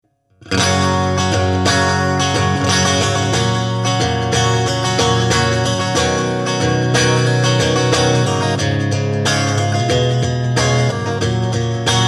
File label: acoustic sim